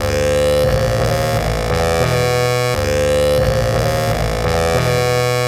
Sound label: Bassss; Sonic Foundry (Sony Creative Software); Synchro Funk